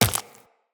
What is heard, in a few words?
Run Flesh, Footsteps; Tenno